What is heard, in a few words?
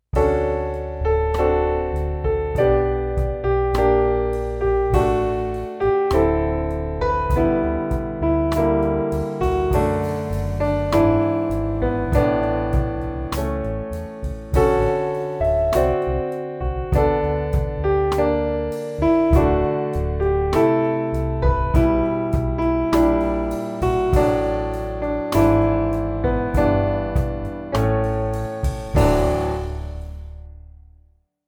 Easy Going Ballad, piano